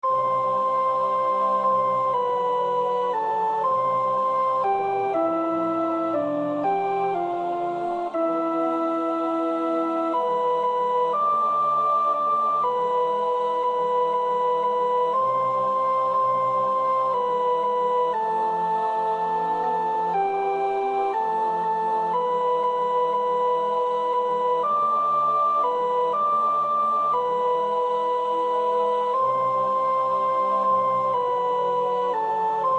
Ik ben de goede herder, sopraan 2; W. Vogel

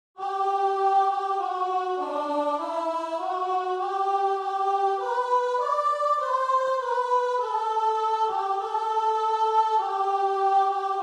unisono; Gezang 445

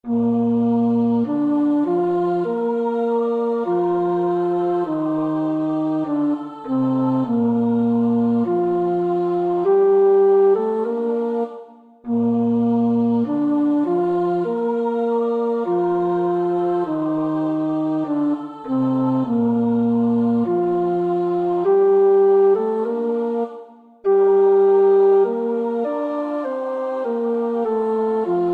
tenor; Nieuw Liedboek; NLB 146a

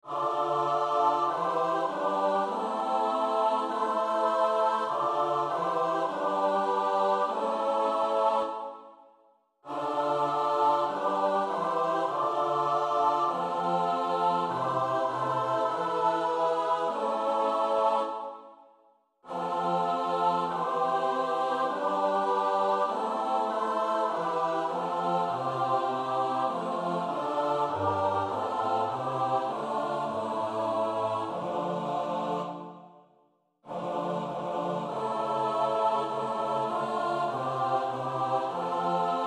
S-A-T-B; Nieuw Liedboek; NLB.149; Oefenbestanden

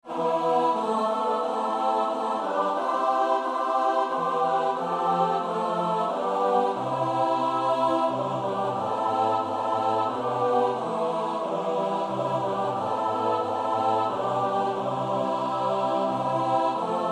S-A-T-B; zonder slurts; Nieuw Liedboek; NLB 583; Oefenbestanden

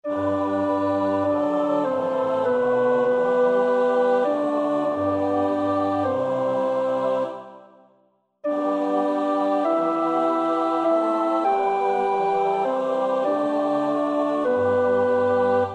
Psalm 25 (alt); Nieuw Liedboek; NLB 025; heer, ik wil mijn hart verheffen; Oefenbestanden

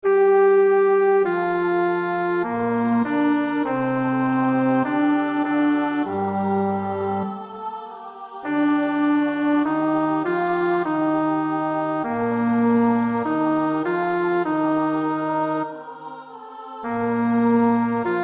bas

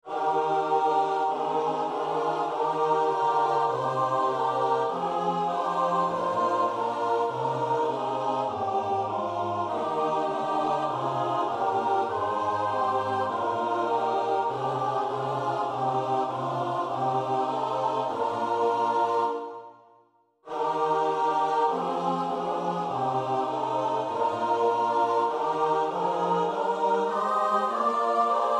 Gezang S-A-T-B; Mehrtens (Frits); Oefenbestanden

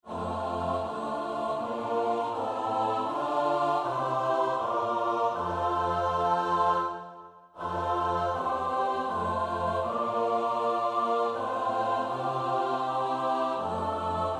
S-A-T-B; Nieuw Liedboek; NLB 977; Oefenbestanden

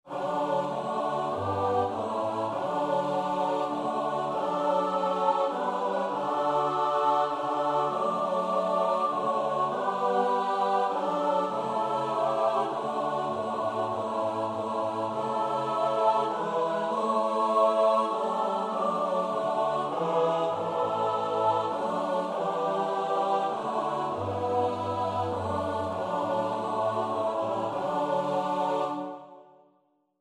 S-A-T-B; Nieuw Liedboek; NLB 534 (Hij die de blinden weer liet zien); Vesperkoor Bunschoten-Spakenburg

